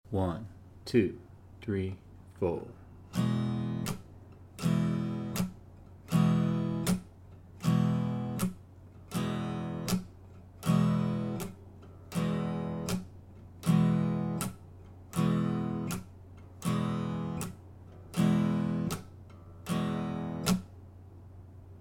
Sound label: strum